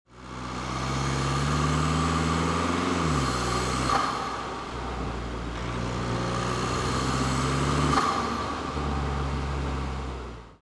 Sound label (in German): Fahrt ohne Intake, Open PEX Air Intake 2.0 TDI; Ansaugung